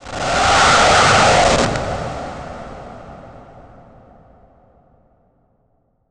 Transition Noise Static; Production Element

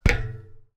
FOOTSTEP - Metal Plate Land Barefoot Male; Barefoot on Metal; Timothy McHugh; GDC Game Audio Bundle

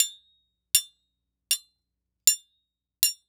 Industry Blacksmith Pound Metal

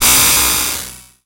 Transition Feedback Distorted; Production Element

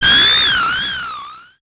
Transition Feedback Electronic; Adobe; Production Element

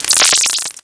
Digital; Adobe; Multimedia Internet CD-Rom Flash

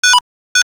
Toy Beep Electronic; Digital; Multimedia Internet CD-Rom Flash

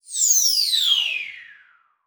Multimedia Internet CD-Rom Flash Whoosh Short

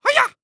Human Male Karate Yell Nerdy "Hi-Yah" Fast

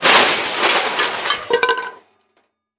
Crash Metal Objects Falling On Concrete; crashes; AdobeCC

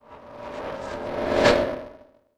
Transition Reverse Warble Reverse; Production Element

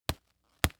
Sports Boxing Gloves Hit

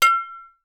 Impact Glass Clink Two Bottles

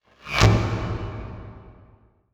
Title Transition Burst; Adobe; Production Element

